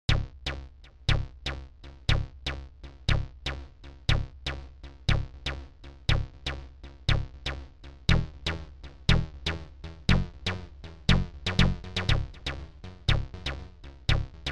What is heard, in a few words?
dream beat